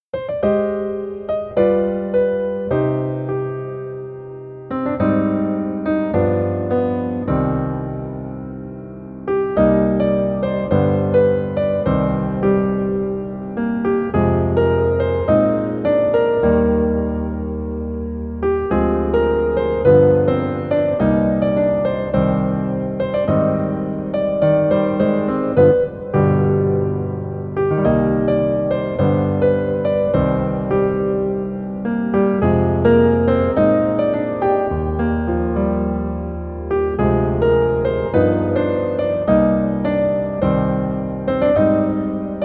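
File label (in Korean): Piano version 멜로디 MR 남자키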